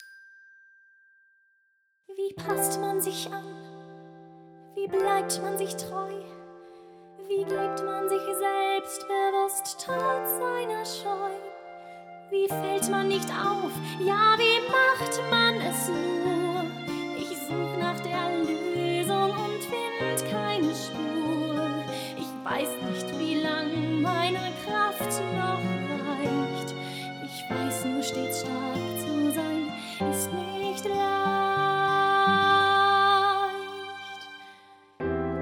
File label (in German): Gesang